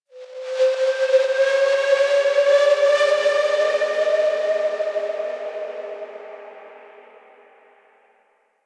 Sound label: Drifting Energy; Ambience; Exterior; Warframe; Railjack